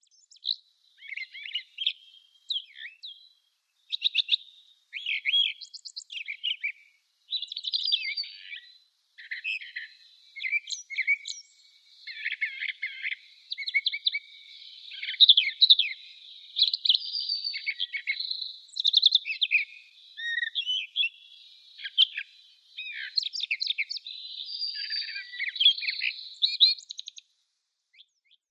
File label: Brown Thrasher song